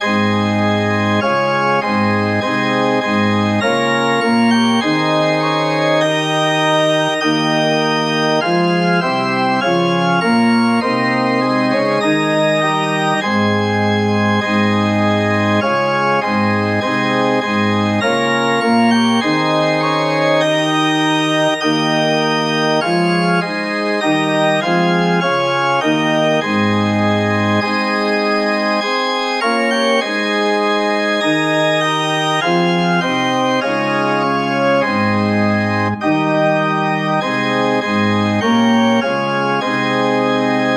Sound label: ORG, organ